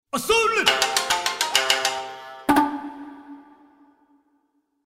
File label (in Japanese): 和風 三味線ジングル; あそれ ぽぽん